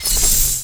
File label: ore magic